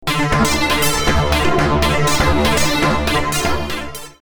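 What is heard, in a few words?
ebm standards, synth; Terratec Komplexer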